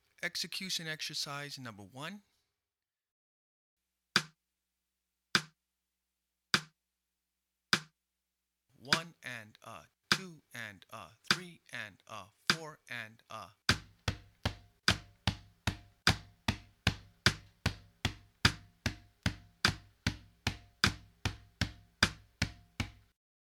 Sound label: Bass; bembe conversations